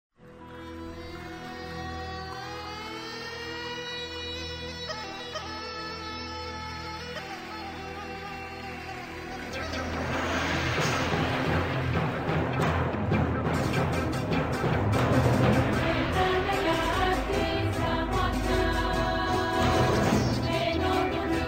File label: Techno Remix; Xena